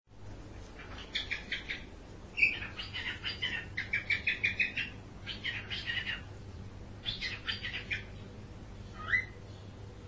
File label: cockatiel; chiko